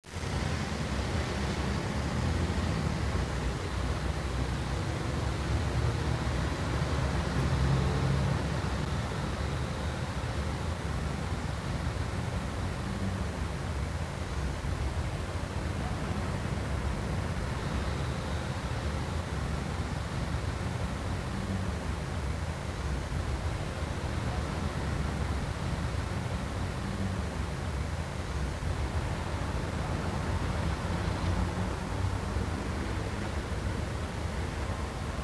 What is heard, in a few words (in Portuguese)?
rua loop; cs rio tropa